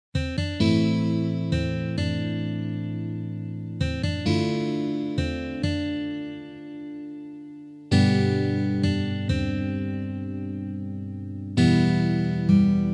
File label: Chorus Slow Tempo, guitar